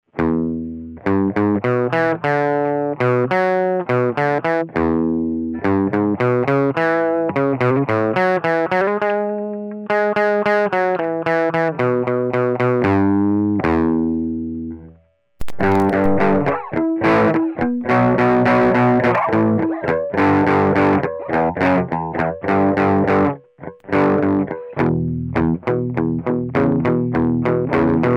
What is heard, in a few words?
Brit Combo, Roland Micro Cube